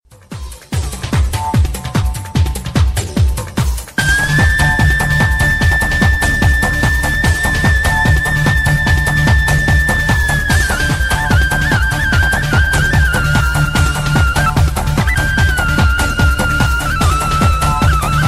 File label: Balkan Folk Mix